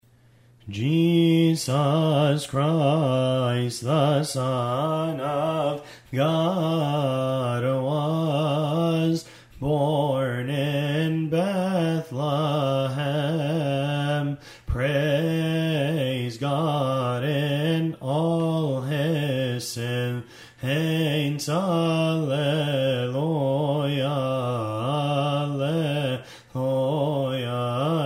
psalm 150 refrain, hymns

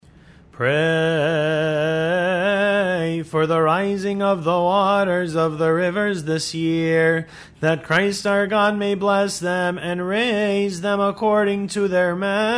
litany of the waters, hymns

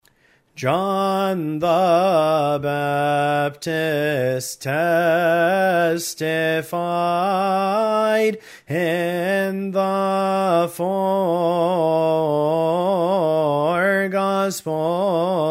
theophany gospel response

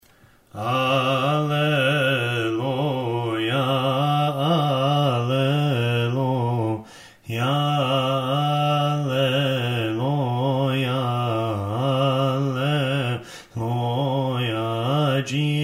vespers and matins gospel response, hymns

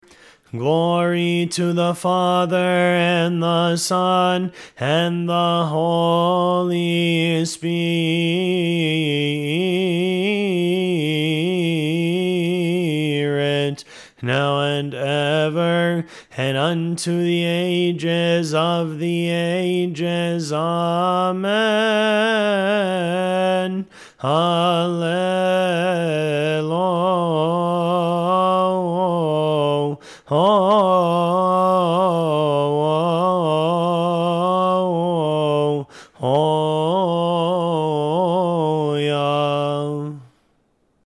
glory to the father, hymns

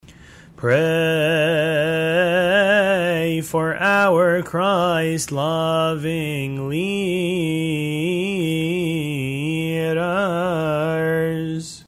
litany of the leader gregorian, hymns

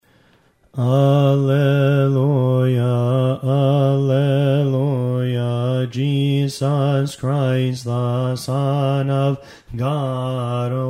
psalm response, hymns

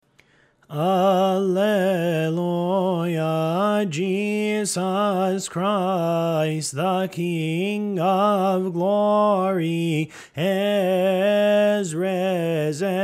psalm 150 refrain, hymns

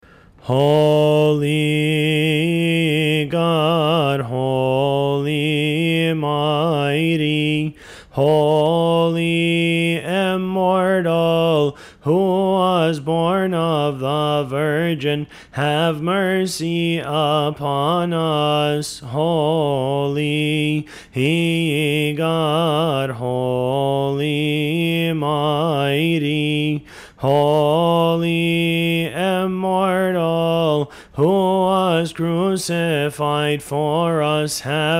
trisagion, hymns

